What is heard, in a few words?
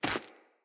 Corrupted Sproutfolk Grunt Step Default; Enemy; Footsteps